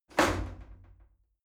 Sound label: shower slide door open hit heavy